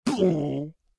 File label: Pirate Barrel; Rabbids Multiverse